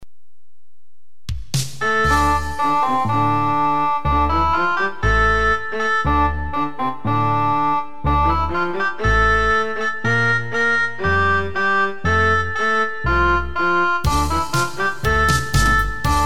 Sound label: Triumph March